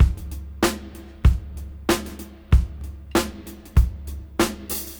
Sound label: Funk 94 BPM; AMG - Keith LeBlanc Phat Butt Jams